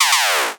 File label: laser